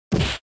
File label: Fighting Hit